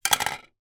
toy gun plastic put down on table

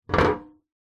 household stool chair metal set down wood deck

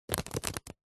foley card pile of beer mats stack

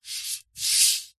sport snooker cue slide hand; zapsplat

